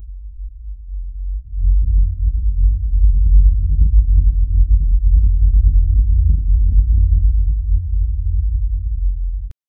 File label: a low deep subterranean t